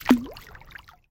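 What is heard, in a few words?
water bloop close low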